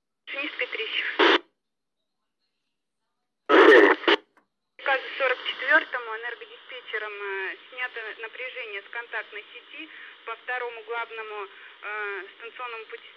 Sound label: Monorail